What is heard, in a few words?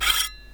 spikes